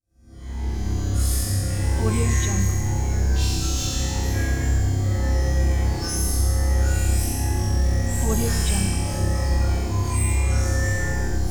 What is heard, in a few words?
scifi ambience; umcsound